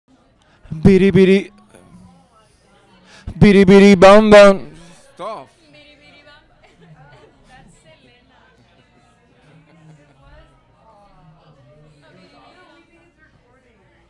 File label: Audience Recording; a-meal-premiere